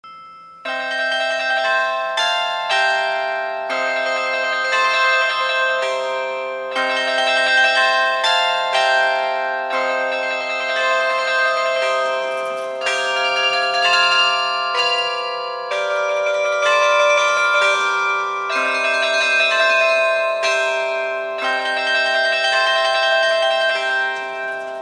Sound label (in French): carillon; Ô douce Nuit; Carillon de Douaï